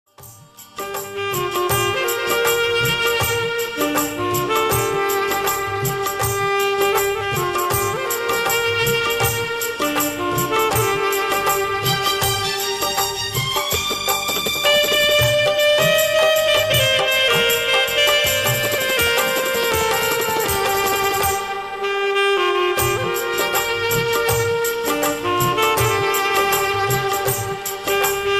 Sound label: saxophone ringtone, romantic ringtone